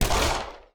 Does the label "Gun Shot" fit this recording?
Yes